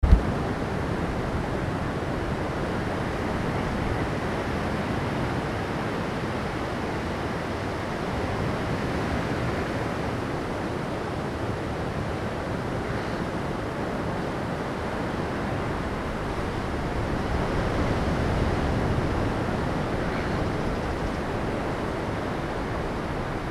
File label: trains; Port Chalmers